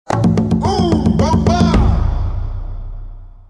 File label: sting